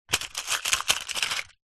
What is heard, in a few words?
box card small safety pins take out few; household